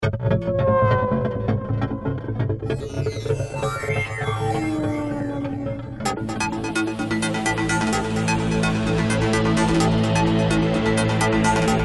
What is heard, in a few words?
jingle